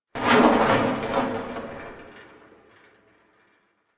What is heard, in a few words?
exterior tractor metal groan; Ambience; Props; Warframe; Duviri